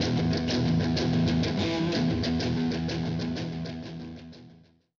guit; music

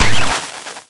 plasma hit; plasma weap

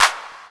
verb clap, c4; BGM; Nintendo; July 2020 Gigaleak

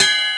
bell

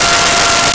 Trumpet F5; Nintendo; July 2020 Gigaleak

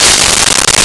Crash; Nintendo; July 2020 Gigaleak

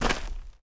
dirt run, footsteps; chief

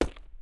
walk, footsteps hard surface; elite footsteps

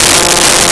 Trombone F3; Nintendo; July 2020 Gigaleak

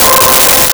Bass Lead C5; Nintendo; July 2020 Gigaleak

